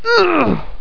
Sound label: JUMP